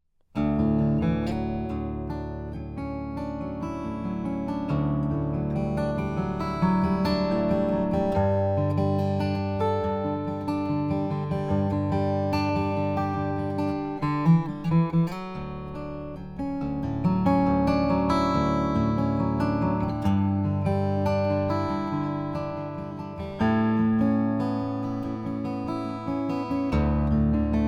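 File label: martin bourbon sunset burst; ceo 8 2 special edit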